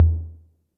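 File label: muffled tom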